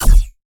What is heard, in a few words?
Sci-Fi Weapons Laser Shot